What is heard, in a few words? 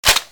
m79 clip on